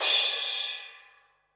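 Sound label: cymbal, Drum & Bass 160 BPM; Ueberschall; Drum & Bass Flash Trackz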